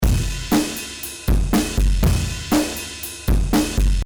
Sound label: Drums Tube; 100 100